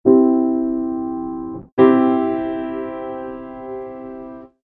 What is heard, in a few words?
grand